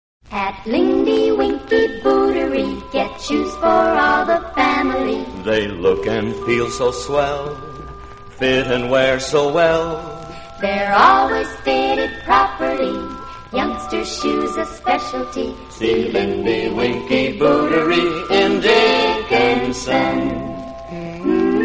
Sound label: radio jingles; lindy winky bootery; allied radio artists